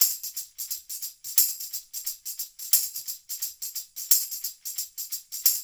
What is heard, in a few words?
LOOP, SHAKERS; Percussion; InVision Interactive; Percussion Slam